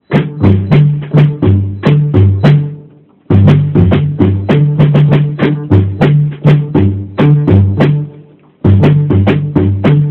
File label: Sabar Rhythm